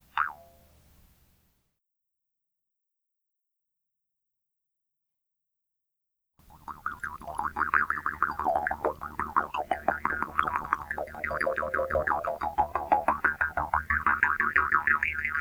jaw harp

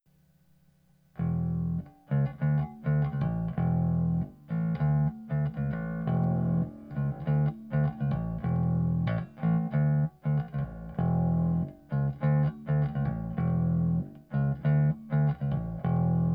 bass

